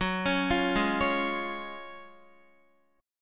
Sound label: Gb Major 7th Suspended 2nd, Guitar; GbM7sus2 arpeggiated; JGuitar